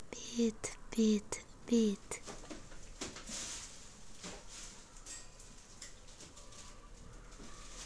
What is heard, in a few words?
beat